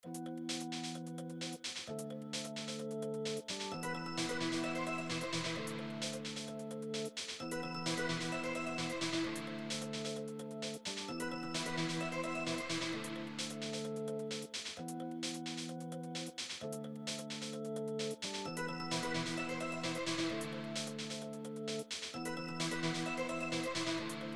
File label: dark